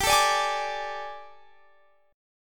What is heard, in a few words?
G Suspended 2nd Add Flat 9th G, chord; Gsus2addb9; sus2addb9:1,2,(5),b9; x,22,19,19,21,22